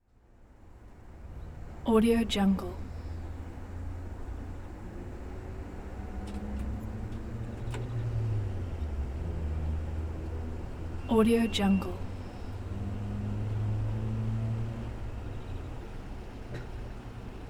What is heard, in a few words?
gas station ambience